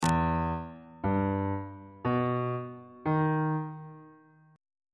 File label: f flat melodic minor 7th chord 1 is f flat minor major 7th